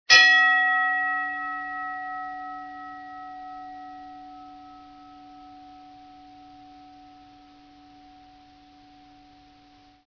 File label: Bell Boxing Hit Ring Long